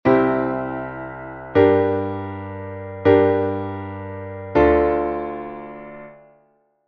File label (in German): Akkordfolge